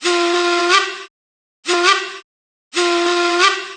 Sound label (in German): D Pfeife 2 kommen Zp11